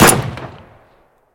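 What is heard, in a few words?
direct weapon shot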